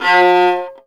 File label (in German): GEIGE; Alpine Volksmusik